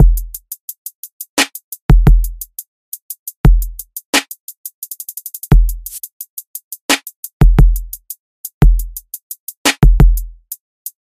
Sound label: Drum Loop